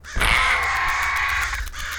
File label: Zombie Hit